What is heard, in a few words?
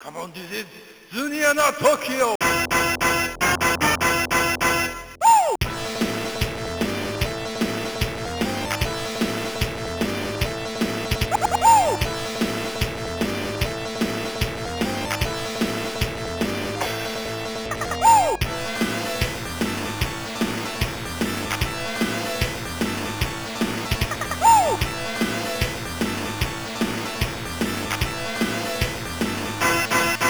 Disco Dance; zunzunkyou no yabou; system c 2; arcade